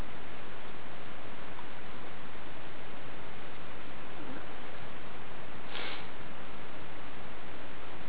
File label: unknown child or female sound